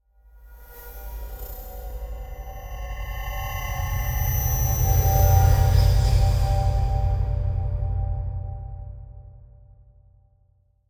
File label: Drone Eerie Breaths Scary Logo; Hollywood Edge; sound designer's toolkit v2